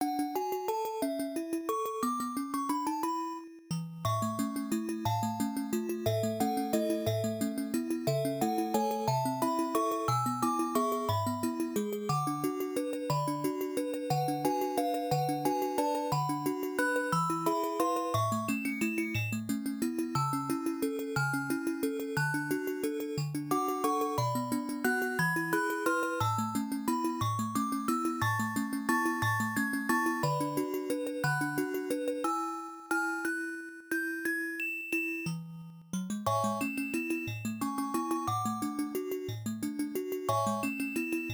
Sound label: Underwater; NSMBW